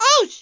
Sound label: HIT RABBIT; franklins birthday surprise